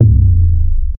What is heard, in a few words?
short fail sound effect s; game ui